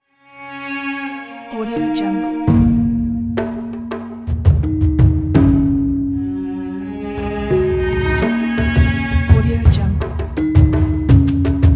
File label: ritual ident